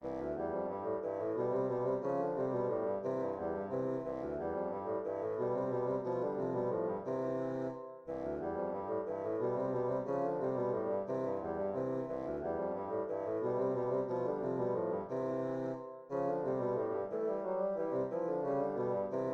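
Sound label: duo bassoon duet; heberle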